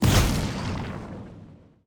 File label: Turret Explode; Mawlek